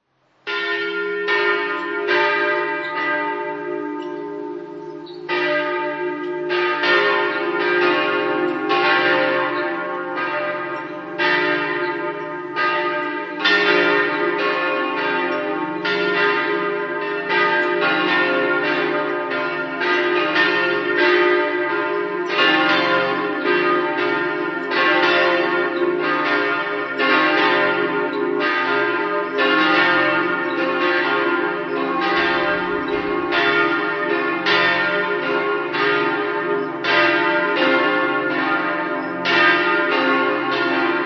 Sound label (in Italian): sequals, campane